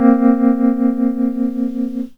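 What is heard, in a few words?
EP vib B2 right; E-MU Formula 4000 Series Vol. 1; Hip Hop Nation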